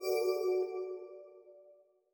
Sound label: wild Swoosh; netent